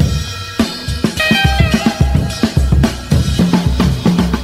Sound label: guitar, funky drum loops